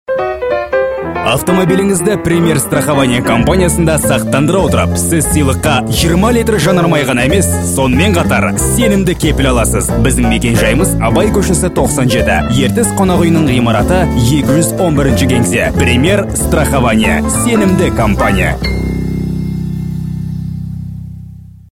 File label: jazz